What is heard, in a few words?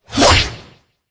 win sweep Horizontal; netent